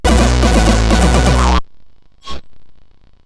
Double Sphere, CSG8580R5

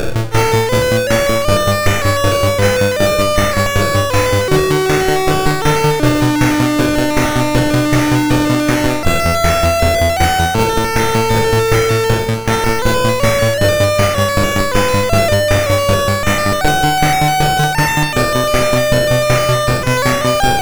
Synth Sample III, MOS6581R2

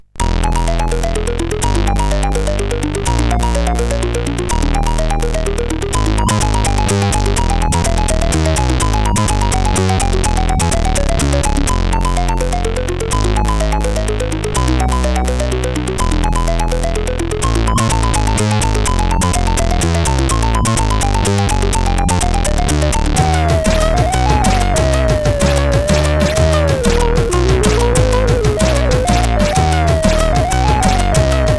sid CSG8580R5

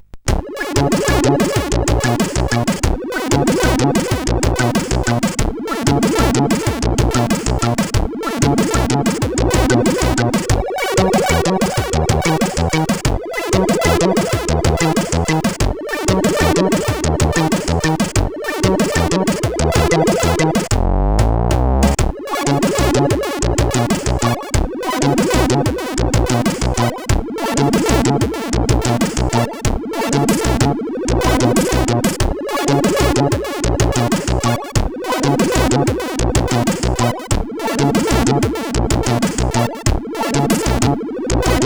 Slow Funk, CSG8580R5

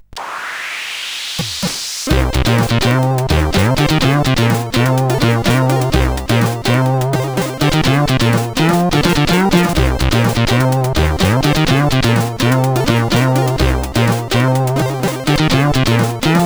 Bassmania, CSG8580R5

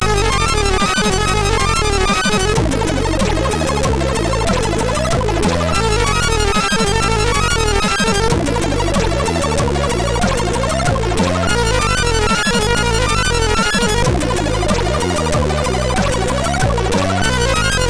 sid MOS6581R3

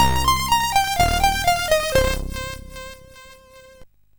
sid MOS6581R2; Move On

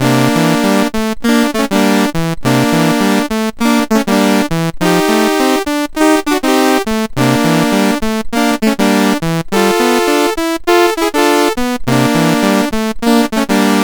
BASIC, sid CSG8580R5; Latimer Joey

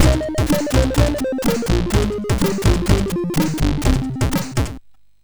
sid MOS6581R4

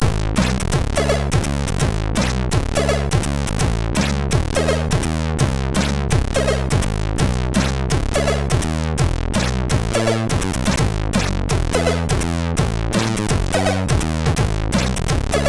sid MOS6581R3

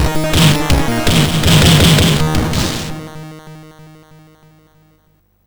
MOS6581R4, sid

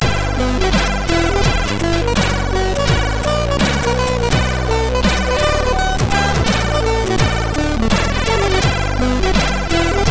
sid MOS6581R3

